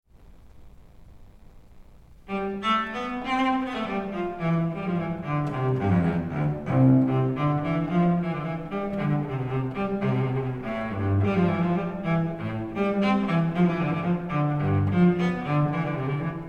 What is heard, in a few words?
Suite for Solo Cello No3 in C Major II Allemande LIVE, Cello; JS BACH